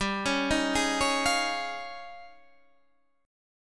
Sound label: D sharp 11th G